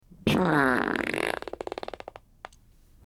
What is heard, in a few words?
messy wet fart